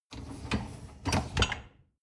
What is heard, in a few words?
dishwasher upper tray draw in short